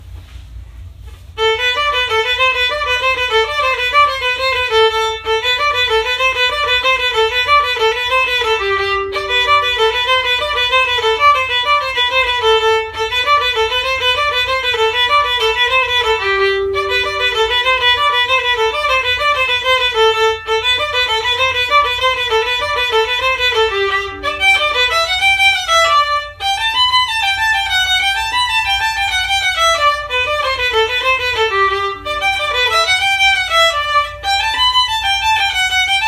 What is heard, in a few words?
Violin; christmas concert practice clips